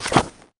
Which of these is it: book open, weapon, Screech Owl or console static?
book open